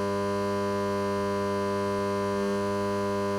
buzz loop

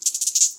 moth chitter; Voice

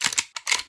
lever action; Guns Interact

